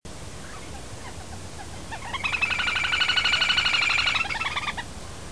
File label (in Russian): malaya poganka golos